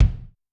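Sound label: bass drum, Kick, Yamaha DTXpress-II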